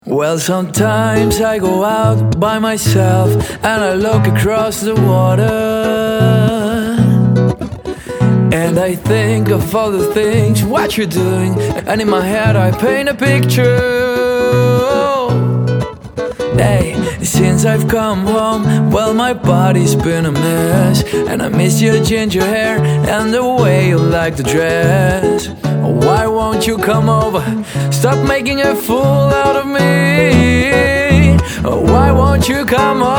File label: R&B (mit Trompete)